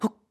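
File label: Climb, voice; BOTW; Breath of the Wild; Link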